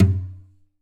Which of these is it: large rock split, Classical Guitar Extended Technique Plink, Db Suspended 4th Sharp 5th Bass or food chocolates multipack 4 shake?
Classical Guitar Extended Technique Plink